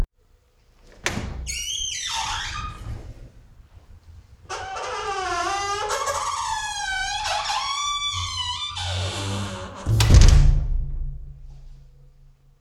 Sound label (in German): Türe Musikkeller